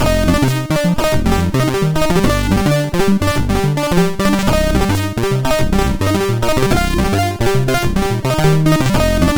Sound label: Reverb, sid MOS6581R4